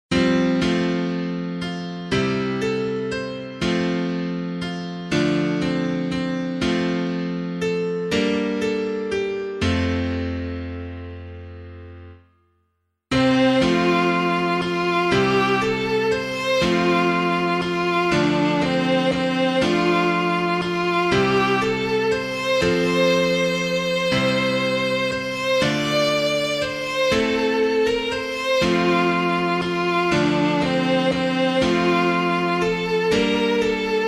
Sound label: piano, hymn; Come Now and Praise the Humble Saint; Williams